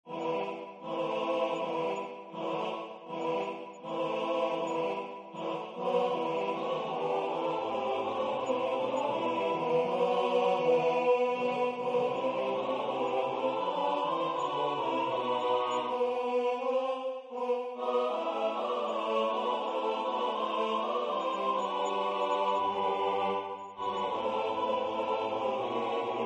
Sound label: SATB; Der Panther